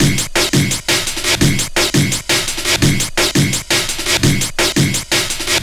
Hardloop, Drum & Bass; Fluid Dynamics

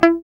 GUI MUTE, E-Gui Muted

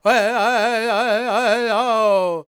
World Voices, Lakota

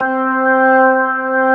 B3 C 3, organ; AKAI S-Series CD-ROM Sound Library VOL-1

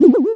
Dazed, Classic SFX, cartoon; musicradar; cartoon caper

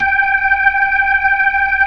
B-3

